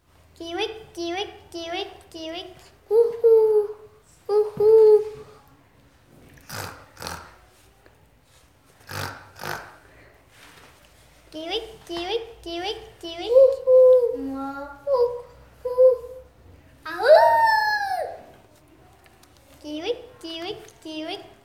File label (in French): chouette